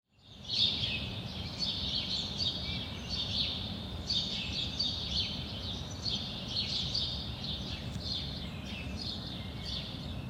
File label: birds